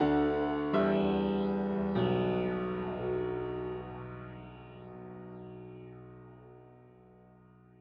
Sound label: piano